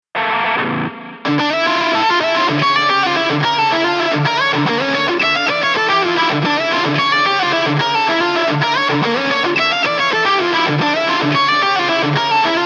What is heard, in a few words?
lead guitars